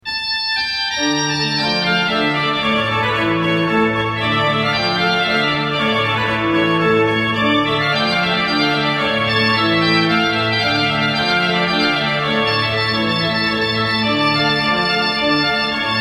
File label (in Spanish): Fandango; Canarias; Jose de Nebra Blasco